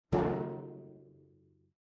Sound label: instrument gong